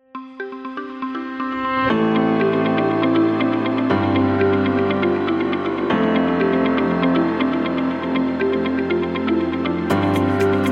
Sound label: Minimal Sound, corporate music; corporate music vol 14